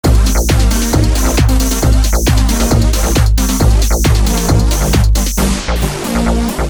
Dance Sound Set; dance&techno set

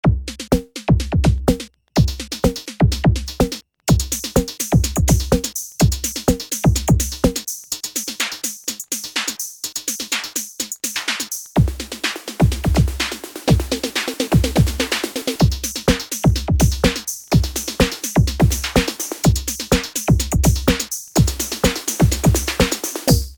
analog drums; microq; microq midisounds; dance&techno set

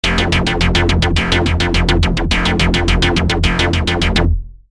Bass Hard Plast; microq

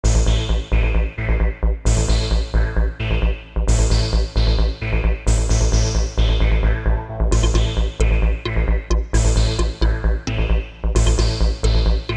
xtreme lead poppy

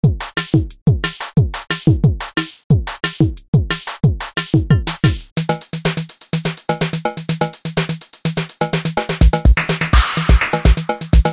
electro drumz; electrodrumz 2002; demotrack

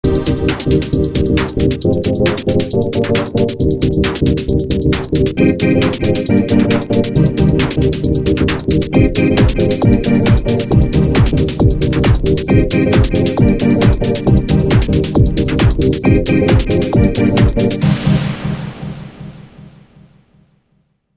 Dance Sound Set; dance&techno set

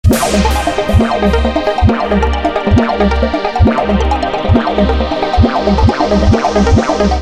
Dance Sound Set; dance&techno set

